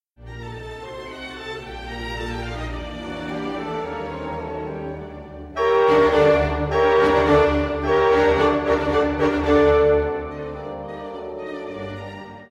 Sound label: G minor min 6, intervals